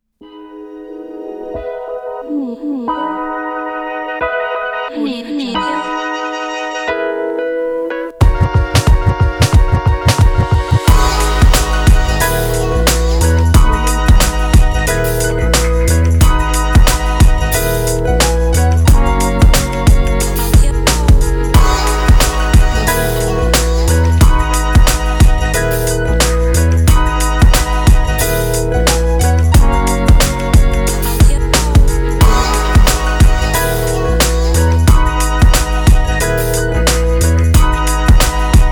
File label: hiphop